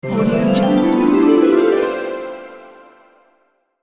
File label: magical harp sparkle transition